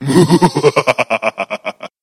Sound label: monster vampire